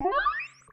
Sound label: Bass Charge